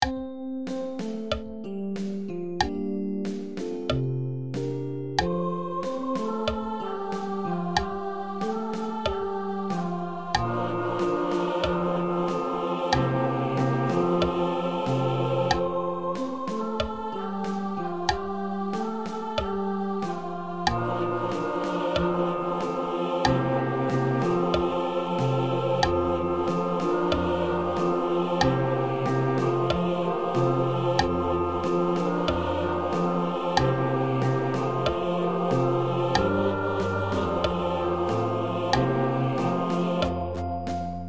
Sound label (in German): gloria bariton slow; paulusfest